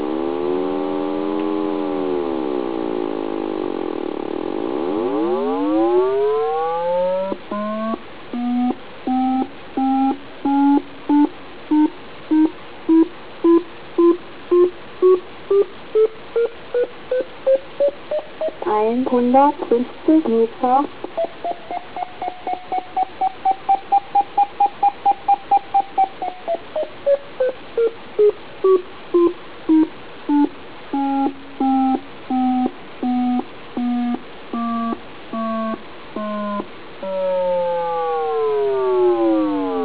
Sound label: 3 tone